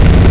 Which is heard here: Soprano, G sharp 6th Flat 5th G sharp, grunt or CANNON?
CANNON